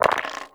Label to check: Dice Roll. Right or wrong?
Right